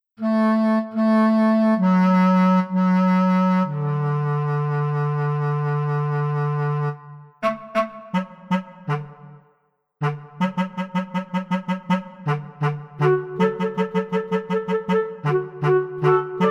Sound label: instru